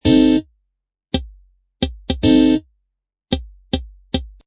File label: Clean Electric Guitar Riff A, Funk Electric guitar loop in A# at 110bpm.; Guitar Loops